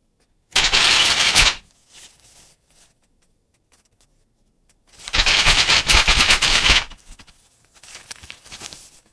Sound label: newspaper rustling sound; flipping pages